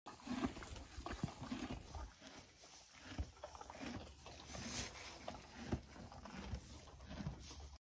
horse chewing

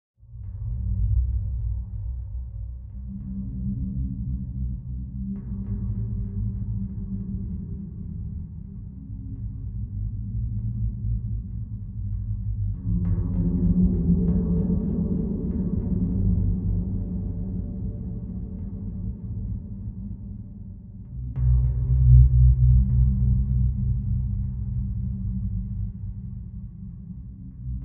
tunnel

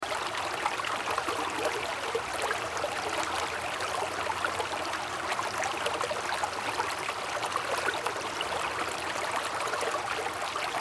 river stream

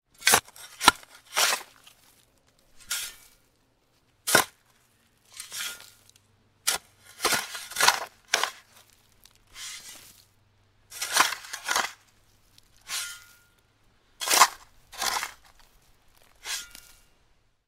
plowing soil

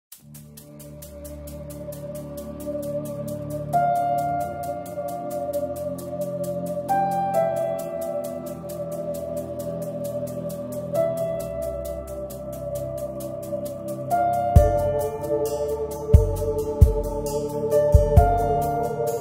mysterious music; موسیقی معمایی بی کلام

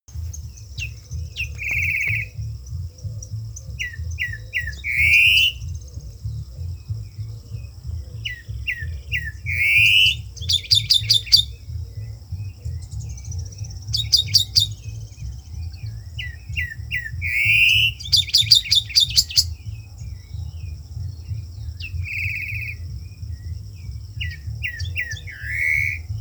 Saltators, پرنده پایکوب

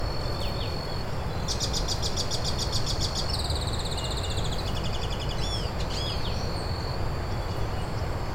Magpie tanager

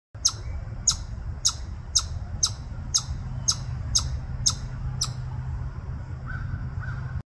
Siberian chipmunk; سنجابچه سیبری

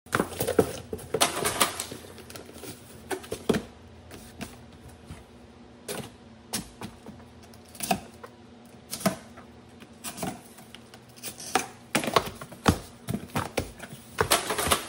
packing

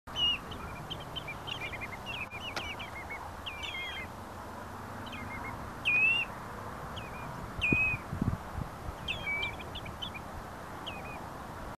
Spotted Redshank; آبچلیک خالدار

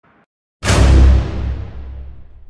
Time change transition

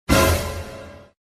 be shocked

